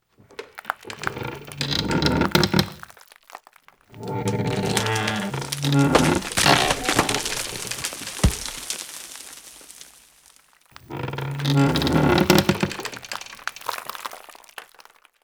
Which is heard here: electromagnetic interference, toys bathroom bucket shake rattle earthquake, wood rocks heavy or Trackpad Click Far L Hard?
wood rocks heavy